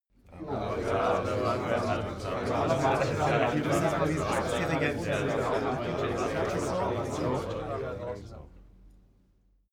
male crowd chatting calmly short

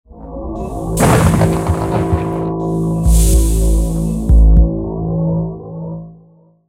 technical suspense

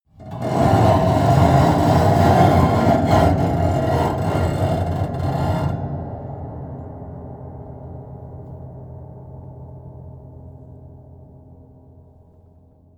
piano bow string broken low slow

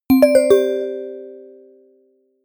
notification bell

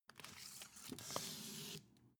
take book soft tonal

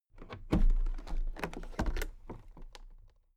toolbox open soft

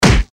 stamp

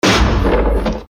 deep ambient impact

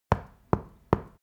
stone knock wall

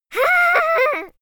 little girl laugh

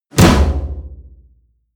percussion

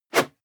whoosh whistle fast hard

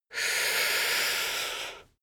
male breath slow in

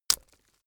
bone break bright

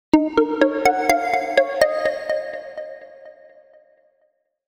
little synth melody

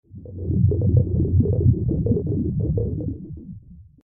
bubbling deep

